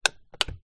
household heater portable fan safety cut off switch